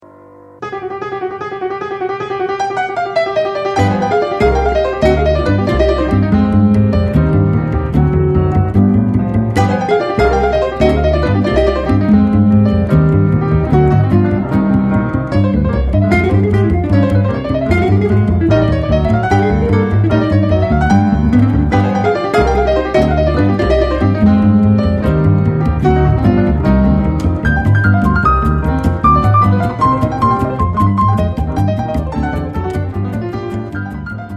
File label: ballade